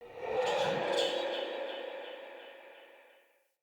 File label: Transmission Out; Fenn Jotar; Transmission In Outs